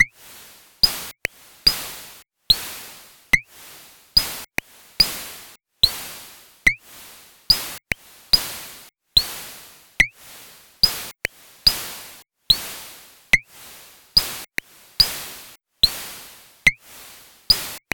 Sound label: D72 Rhythm